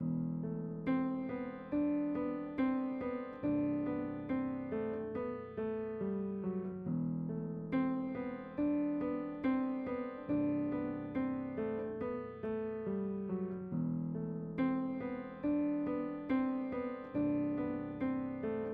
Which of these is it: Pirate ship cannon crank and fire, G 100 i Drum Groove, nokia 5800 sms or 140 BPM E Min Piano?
140 BPM E Min Piano